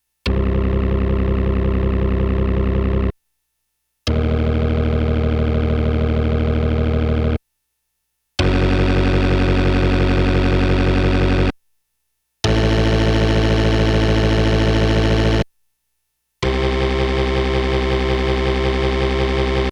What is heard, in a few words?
Roland JX-1 ROCK ORGAN - dry